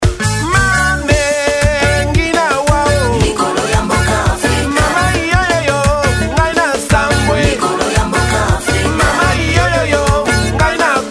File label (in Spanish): Champeta